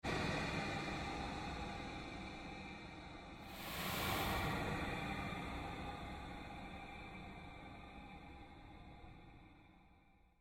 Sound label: Created Pulse Fade, Rise And Dive Sound Effects